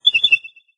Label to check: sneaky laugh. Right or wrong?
Right